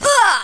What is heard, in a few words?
jump; alienarena; invader